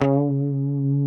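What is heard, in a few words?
C#3A, compressed Strat, guitars; Spectrasonics; Hans Zimmer Guitars VOL-1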